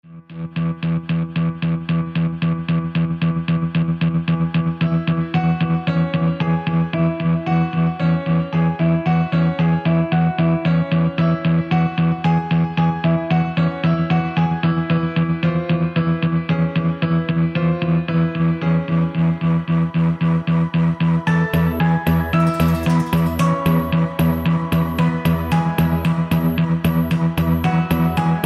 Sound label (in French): PRELUDE FLUTES ET HAUTBOIS